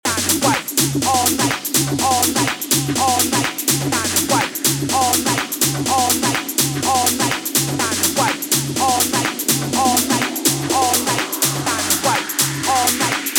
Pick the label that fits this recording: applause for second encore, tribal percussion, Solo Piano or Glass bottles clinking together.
tribal percussion